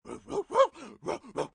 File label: dog long